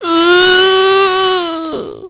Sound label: zombie female die